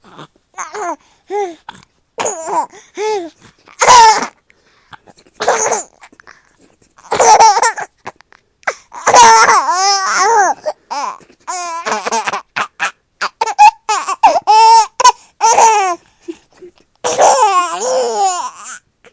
having a good old giggle

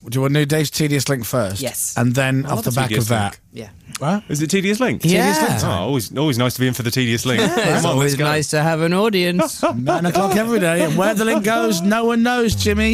laughs; jimmy carr

